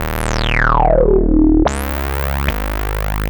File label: synth, Hardcore Breakbeat, 145, Ebmin; Big Fish Audio; Hardcore Breakbeat Action; Riddim